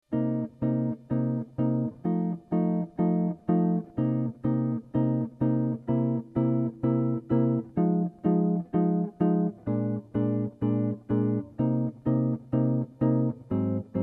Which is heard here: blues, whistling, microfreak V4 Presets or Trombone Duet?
blues